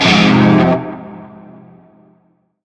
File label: Shawzin Min Chord; G; Warframe; Narmer Shawzin